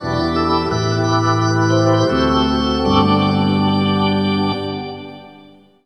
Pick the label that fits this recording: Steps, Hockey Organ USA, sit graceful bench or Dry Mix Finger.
Hockey Organ USA